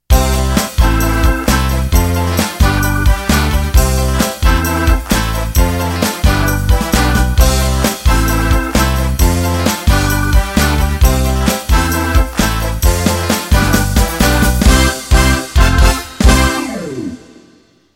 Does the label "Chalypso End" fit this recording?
Yes